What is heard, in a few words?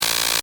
Buzzer Game Show; Alarms; BlastWave